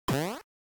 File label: torch; Shantae